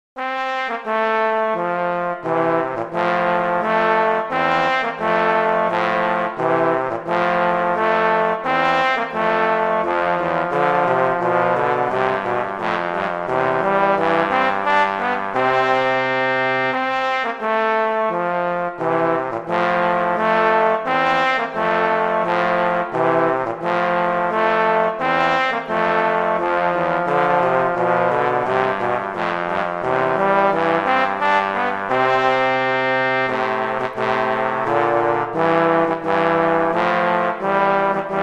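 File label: Duet, horn; Mozart; Duets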